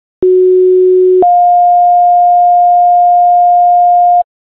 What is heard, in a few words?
siren, tones